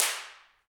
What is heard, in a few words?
PRC, Clap Modules; Roland L-CDX-01; Clap & Snap